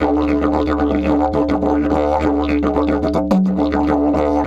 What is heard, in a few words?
108, Didgeridoo